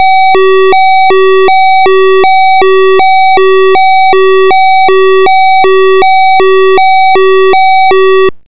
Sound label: ambulance